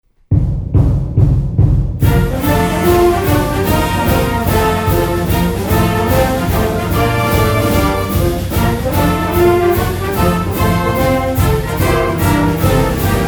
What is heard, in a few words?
Fight Song Band